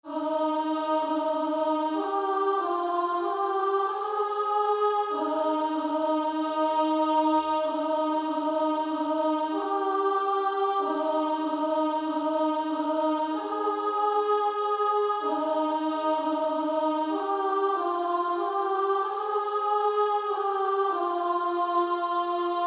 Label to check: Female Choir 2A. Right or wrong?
Right